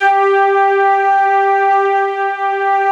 ST ORG, STRINGORGAN, Yamaha VP-1

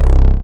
Bass One Shot (C), Synth Bass

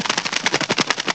WNG FLAPS; CMI Sound Library Rev 1.3

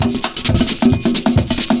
PERCS, 70 s Breakbeats

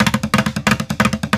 Street Groove, Ambient Grooves

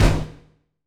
HIT 4 FX; Bolder Sounds - Granular

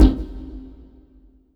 DRM PRC Tech Bell; Polyend; Plughugger

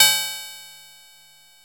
SYNTH BELL; Zero-G; Ambient 1

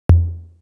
Tom, Drum One Shots; Coldcut's Kleptomania VOL1

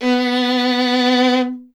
STR VLN, Vln Detache; Miroslav Vitous

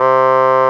OBOE C3; AKAI S-Series CD-ROM Sound Library VOL-2

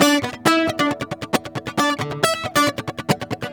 merengue, World Grooves; Best Service ProSamples vol.14; AKAI

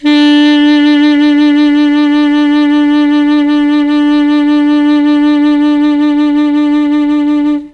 D2 ALTO SAX, Mellotron; Mike Pinder - Mellotron Archive CD; CHMB ALTOSAX

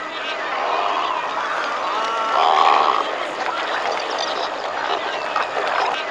HOT RIVER BIRD, HOTLANDS; Zero-G Ambient 1

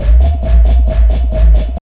LOW 135 FX, Big Beat

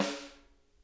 snare rim, drum kits; Sonic Reality; Interactive Drum Kits

